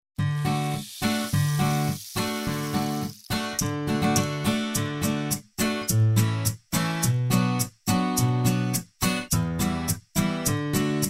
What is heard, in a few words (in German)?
instrumental refrain